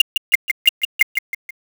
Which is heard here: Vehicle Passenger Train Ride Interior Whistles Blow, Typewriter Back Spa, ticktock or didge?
ticktock